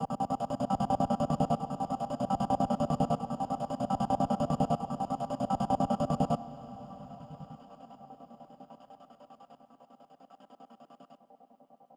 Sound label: Overlay Dark; Benefactor